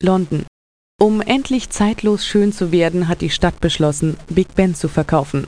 big ben; holiday

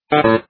salahclick

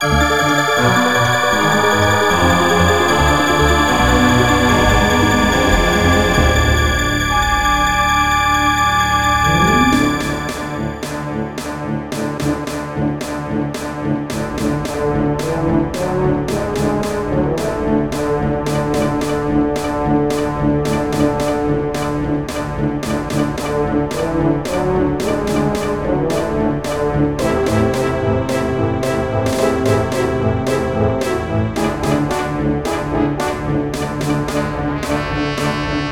TRAIN SIM; Total Web Page 99

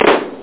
claps; Amiga 8-bit Sampled Voice; 8,363 sample rate

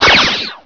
Laser Zap; Bunny Killer III

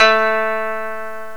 koto; gmplay; ethnic

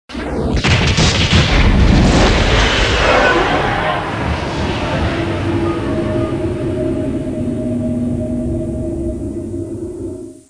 jump; Babylon 5

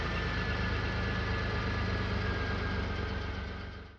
tank

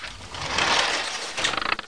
Transport On; Professor Fernleaf from the Tropics

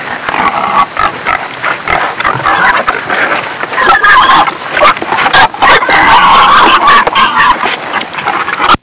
hogs, farm animals; Sound Effects for Multimedia - Volume 1

